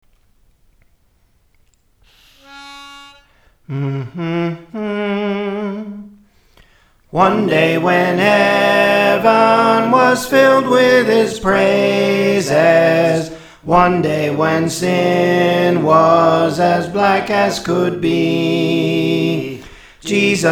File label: Soprano, Praise For The Lord Training; One Day